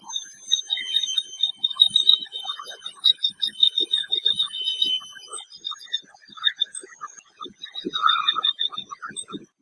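Blue Eared Barbet adult conflict calls, Kledang Sayong Forest Reserve Ipoh Perak Malaysia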